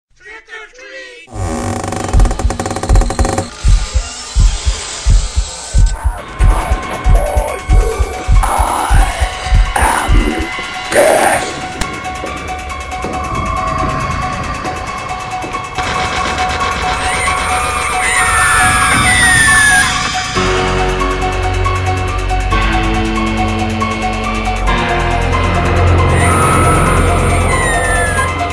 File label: Scary Halloween